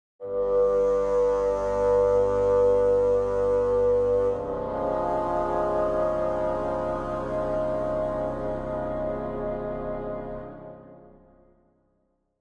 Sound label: bassoooooons; BASSOOOOON